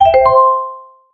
vibraphone

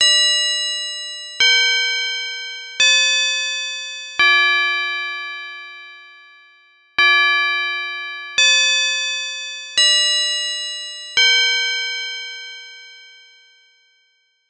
the sound of a chime

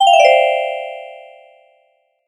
music box

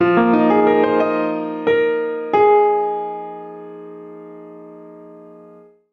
piano short

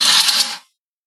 curtain

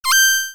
surprise

